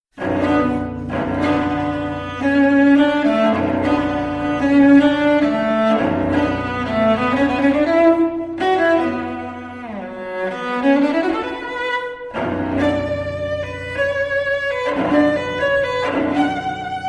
Sonata For Solo Cello Op 8 1 Allegro Maestoso Ma Appassionato; Kodaly